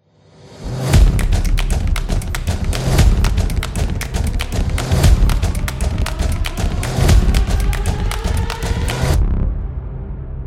Sports Intro

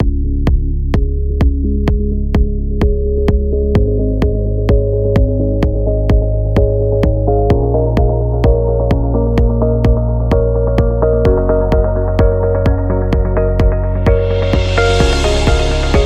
Corporation

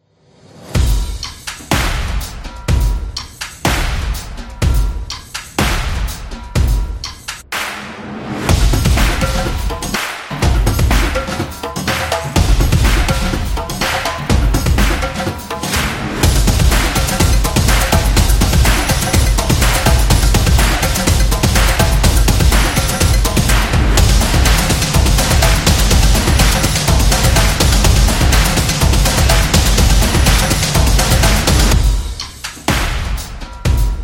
Stomps; sports

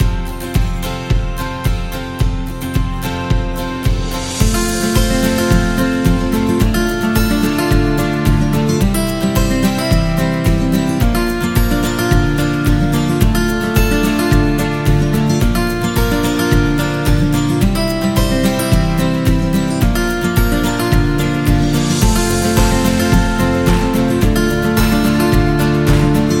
Acoustic Guitar Inspiring; happy music vol 8

